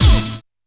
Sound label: Uh! + Hit